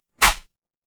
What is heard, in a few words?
paper fabric cloth whip